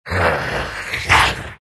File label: big snarl; Undying